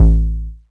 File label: bass juno A, Bass Shots, Chicago House; Classic Chicago House